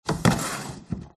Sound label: open window